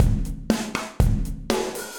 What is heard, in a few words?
Kit Low Loud Squashed, Standard Kits; Ableton; Factory Packs; Session Drums Club